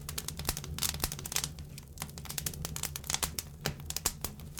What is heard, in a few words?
fire wood burn